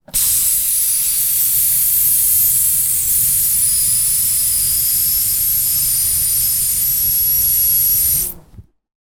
balloon deflate air hiss; foley; zapsplat

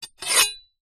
kitchen knife large scrape on metal; household

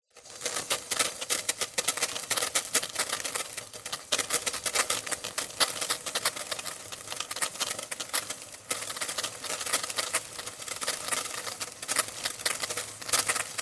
household water sizzle in pan very shallow; zapsplat

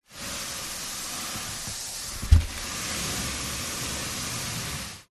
foley cardboard box push slide inside box pov; zapsplat

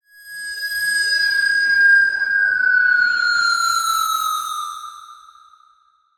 sound design whistle descend delayed panned

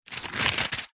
household book telephone directory page thin turn

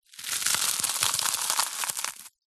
food crispbread squash crush in hand

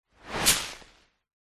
foley tree leaves branch whoosh impact designed

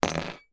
foley metal clip small down on metal surface; zapsplat

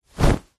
foley clothing sports shorts single flap; zapsplat

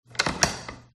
door lock; fox audio

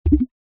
multimedia game designed pop wet

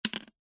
token plastic set down wood slight spin; foley

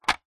leisure toy truck plastic set down

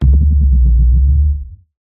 ui computer complex lowend

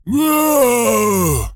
Screams & Shouts Zombie; soundbits

